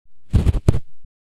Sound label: Lavalier Lav Microphone Pop